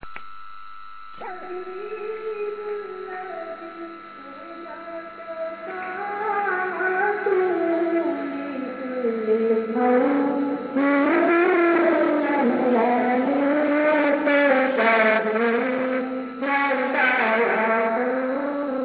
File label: naat